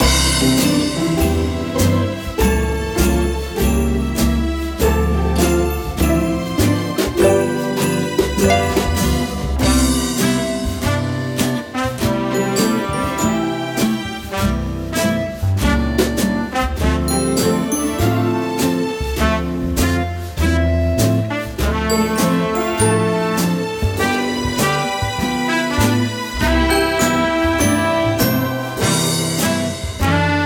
Swinging Jingle Bells; Anger Music Library

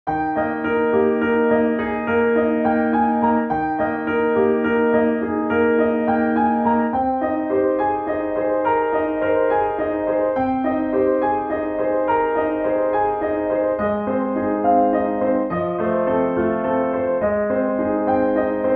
Raindrops; Anger Music Library

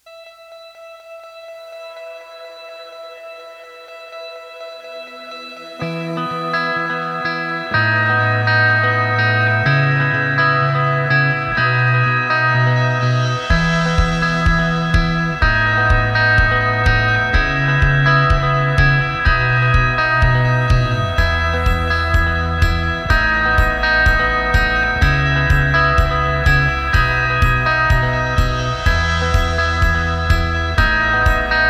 Touch The Sky, Star Guitar; Anger Music Library

